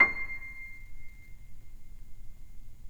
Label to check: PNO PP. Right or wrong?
Right